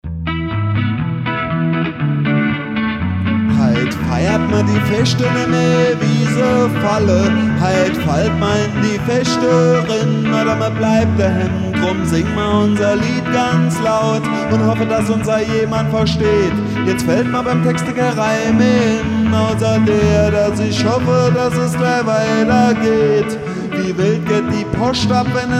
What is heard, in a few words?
Reggae (Live Medley)